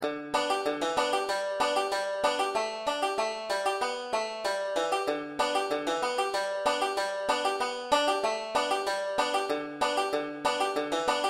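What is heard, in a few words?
5 string g solo, banjo; brethren we have met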